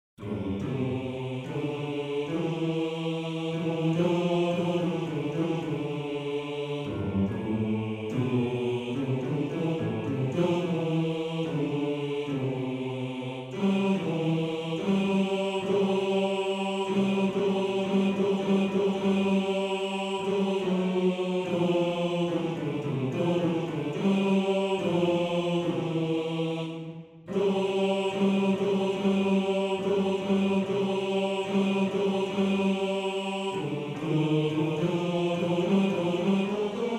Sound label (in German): Bass; Bass 1